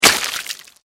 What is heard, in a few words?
splash blood; imp audio